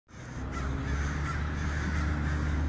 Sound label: Crows in traffic